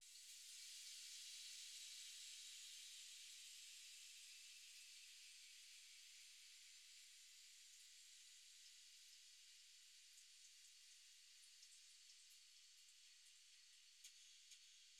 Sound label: 128 BPM C Min Ambience; Loop Stems; Cymatics; Feelings; Breakdown Loops